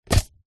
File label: coffee cup disposable plastic lid remove; food drink